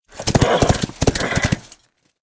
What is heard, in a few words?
Armored Warhorse Attack